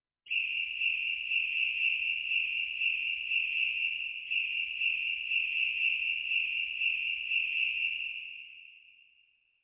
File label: Whistle